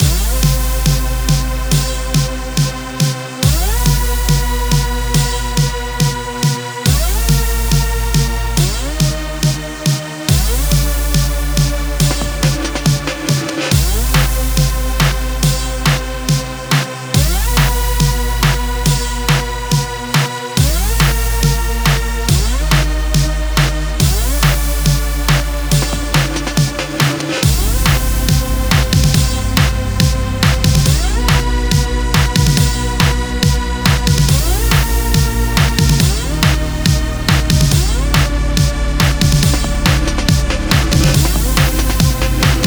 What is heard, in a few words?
Hoover - 140 BPM; Spartaga